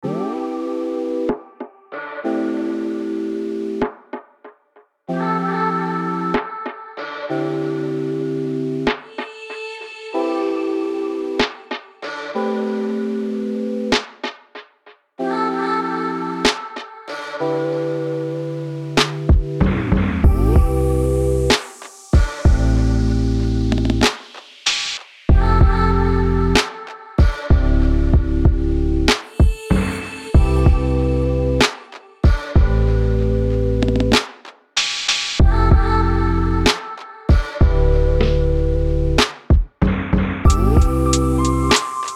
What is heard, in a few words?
lazy day stylish futuristic chill